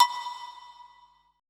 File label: COWBELL B1, PERC; BPC PERC